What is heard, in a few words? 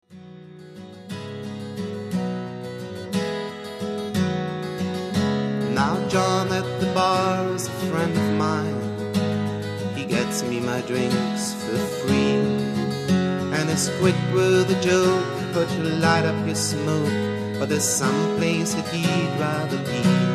Piano